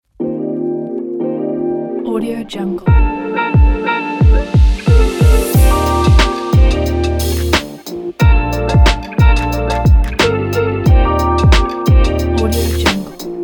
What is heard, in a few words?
cozy lounge hiphop